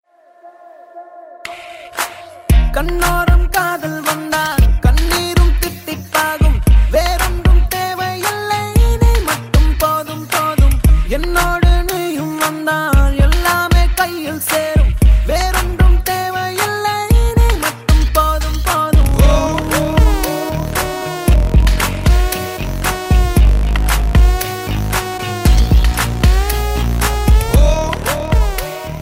bgm, ringtones; blues

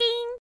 Ding

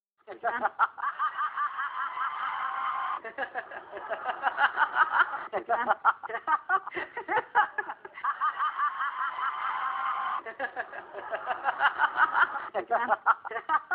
Laughing; Hillary

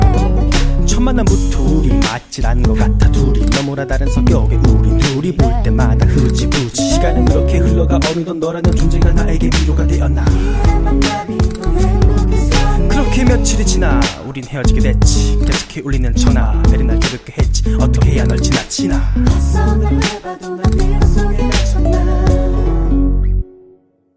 Rap

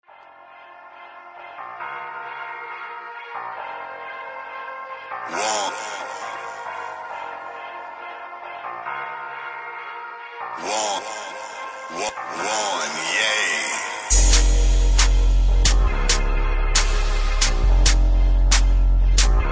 instrumental

